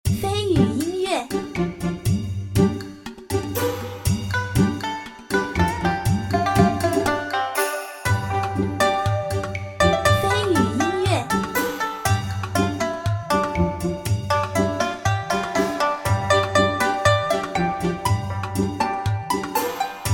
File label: city